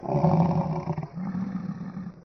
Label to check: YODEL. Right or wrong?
Wrong